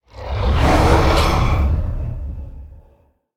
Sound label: ground; smite; thanatos